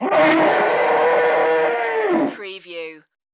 Elephant Trumpet Angry

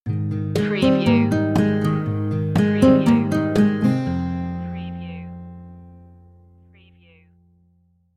rain and sunshine stinger

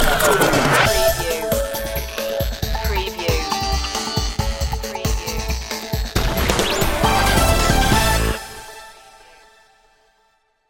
diy sting

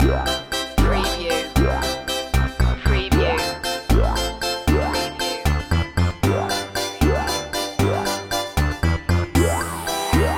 retro video game circus loop

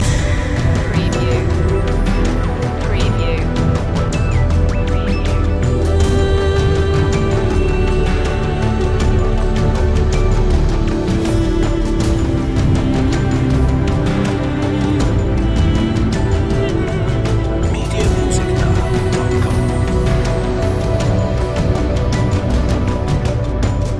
forest fantasy loop

